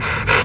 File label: barnacle pull; hl2